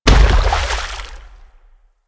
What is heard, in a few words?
Infested Femur Step Water; Warframe